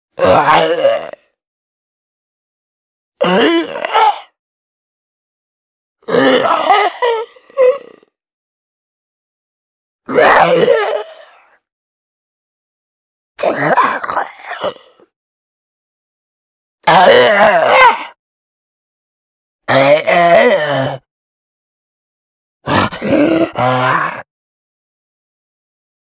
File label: ZOMBIE MALE GETTING HIT HURTED LONG